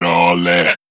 Vocal one shot; Slate Digital Omnivox Vocal Sample Pack

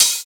hat; Hats

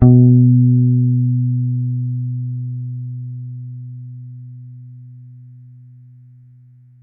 Bass one shot High B, Electric Bass; Slate Digital BASSHOLE Sample Pack

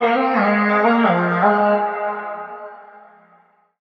vocal pure Ab; SD AURORA Sample Pack; Slate

